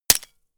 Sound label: gun shot silencer attached; schusswaffen